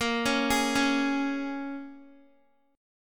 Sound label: A sharp Minor 7th, A sharp, down, Guitar; A#m7; arpeggiated